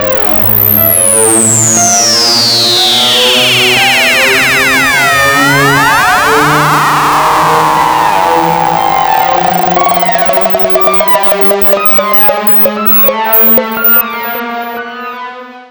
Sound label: Ball Rise